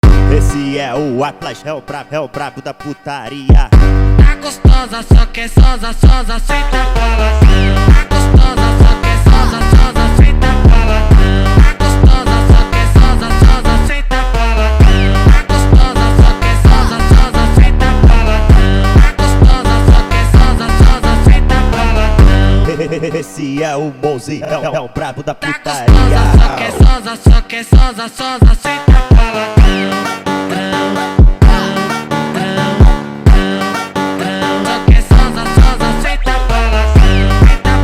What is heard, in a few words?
Brazilian Phonk